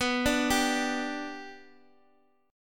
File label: B Minor Sharp 5th, Guitar, DADGAD